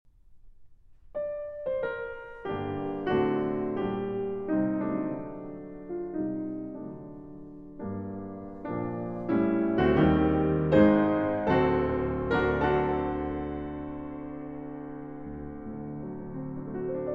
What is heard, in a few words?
Cello Sonata in G Minor Allegro Moderato